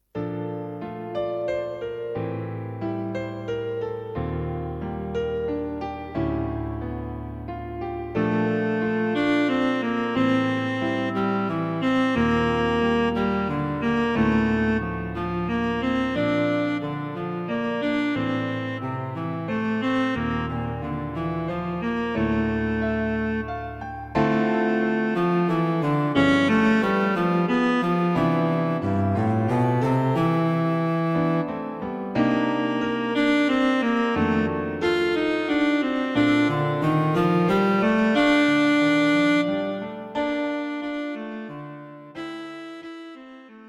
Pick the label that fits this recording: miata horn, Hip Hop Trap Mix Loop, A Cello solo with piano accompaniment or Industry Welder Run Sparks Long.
A Cello solo with piano accompaniment